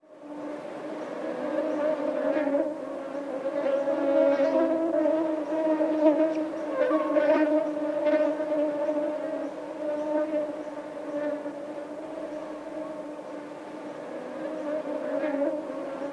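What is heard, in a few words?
mosquito sound; komar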